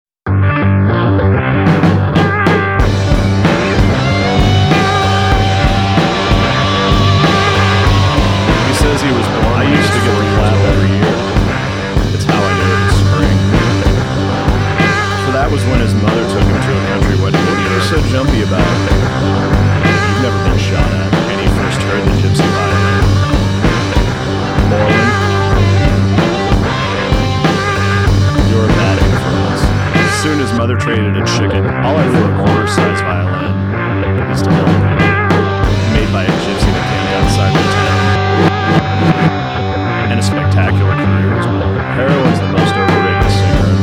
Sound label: gypsy violin